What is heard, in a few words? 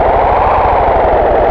shock